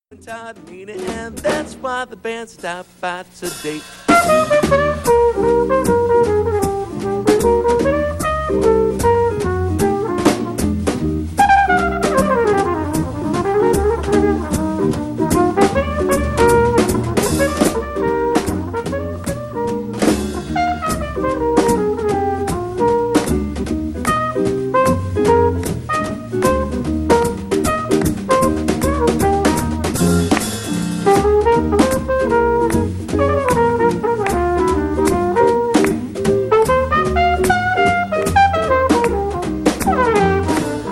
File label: flugel solo